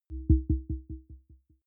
button echo beep bass

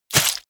hit blood light

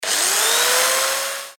drill

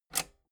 metal button robust spring push disable

